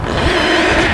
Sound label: Machine Motor Engine Harsh